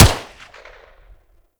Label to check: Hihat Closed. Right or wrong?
Wrong